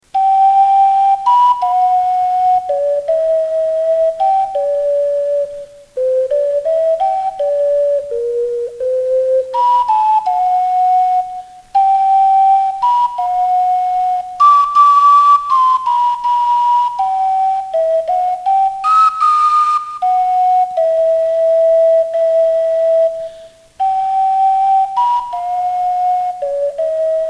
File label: Ballad Echo; Breath of Fire - Sara